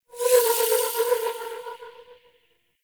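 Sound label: Gyromitra Shroom Projectile Whiz By; Warframe; Nokko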